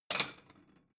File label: plate small take hard